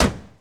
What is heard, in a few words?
65 Corvette Door Close; Automobiles